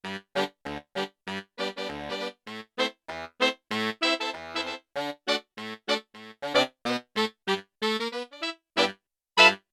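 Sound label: octave unison, Four Saxes; Yamaha TX16W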